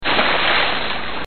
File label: splash